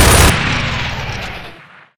troika fire, turrets; gow

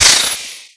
turbo blowoff, vehicles

